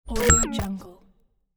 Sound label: scifi reprogram switch; cinematic-sound-design